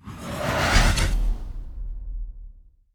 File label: enemy summon cast